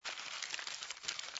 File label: Roll Paper